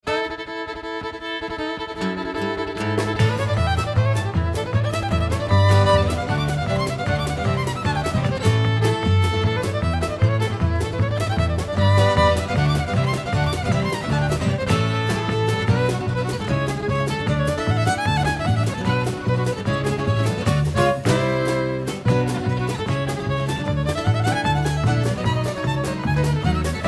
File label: Banjo